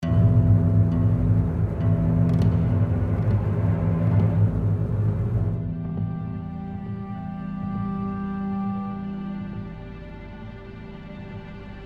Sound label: Stealth (Loop)